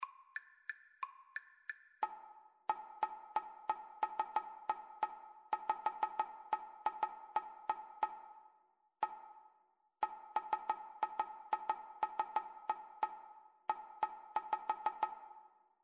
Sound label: rhythmic dictation